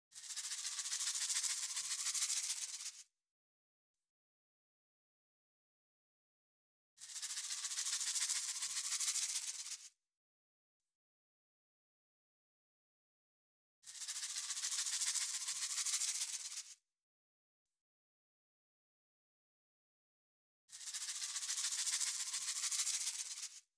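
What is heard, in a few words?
shakers 070, Hip Hop India; 070bpm; Hip Hop India Modern Percussion; Apple Loops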